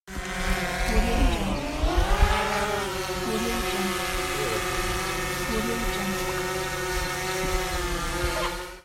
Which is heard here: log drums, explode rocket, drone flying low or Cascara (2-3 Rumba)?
drone flying low